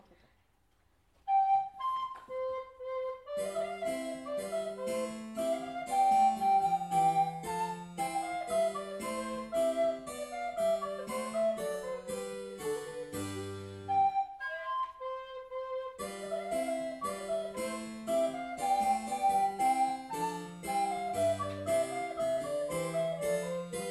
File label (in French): flute a bec alto, le fanfaron; Examen 2017; 1cycle 2annee